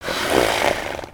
horse snort; creatures organic

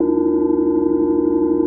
Run Pad; nexus tower

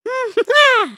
dance; emotes

